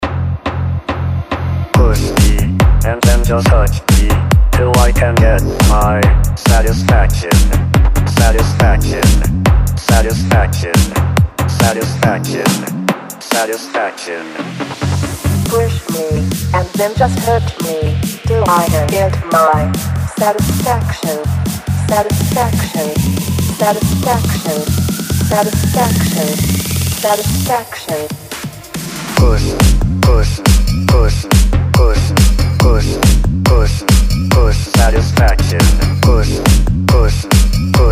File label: remix 140 bpm